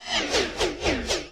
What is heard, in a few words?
Super Jets Overhead, Sound Design - Sci-Fi; Sony Pictures Sound Effects Series Volumes 1-10; Sony Creative Software